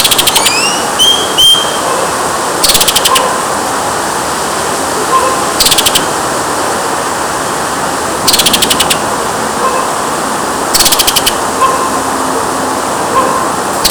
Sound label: Piranga Rubra; Aves; Passeriformes; Thraupidae